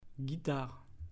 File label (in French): Guitare